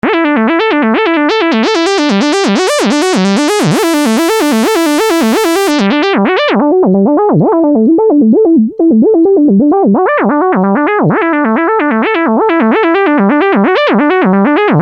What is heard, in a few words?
Arpeggio 130 A, Techno Synth Loops; 130bpm; Sonic Foundry (Sony Creative Software); Signals I